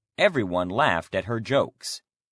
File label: laugh